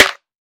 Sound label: clicky snare sample C sharp key, acoustic snare sound tuned to the C# note